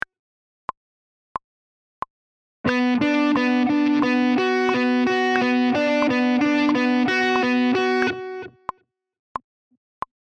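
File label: with click; Introduction To Hybrid Picking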